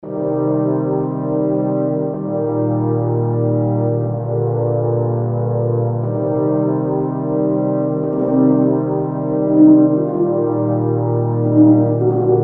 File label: Orchestral Lounge